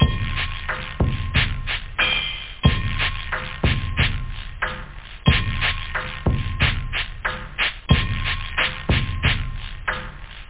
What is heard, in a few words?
ping; da capo vol1